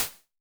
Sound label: Snare Hats, Vermona Mono15 Drums